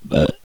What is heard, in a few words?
burp